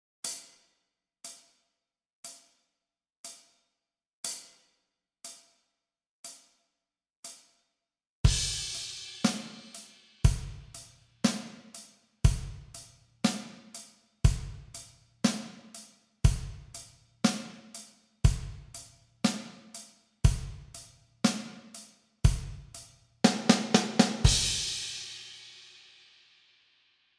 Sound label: 060 bpm, practice tracks